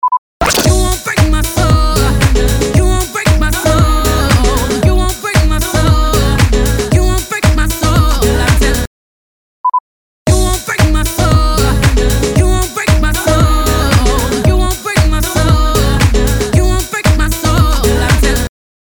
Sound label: 115BPM C#m